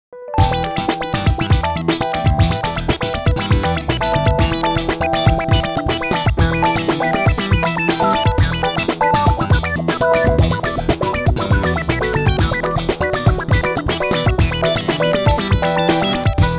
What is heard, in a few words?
Elec, EDM 리듬